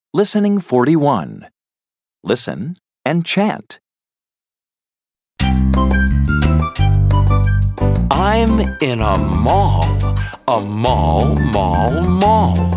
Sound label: Chant; Family And Friends; Family 3